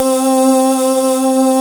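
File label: organ vox C4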